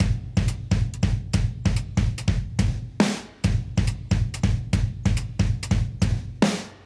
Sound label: Modern Breaks; Touch Loops; ClassicBreakSessions